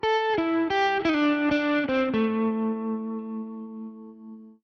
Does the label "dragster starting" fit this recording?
No